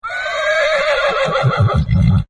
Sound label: Horse Whinny; Horses; Partners In Rhyme